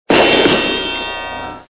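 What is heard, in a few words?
sound of falling metal with clang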